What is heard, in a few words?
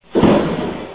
thunder